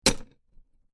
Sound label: Chain Impact Chain Metal Impact; Metal Sound Effects; LYN103 package of Chain Sounds; Lynne Music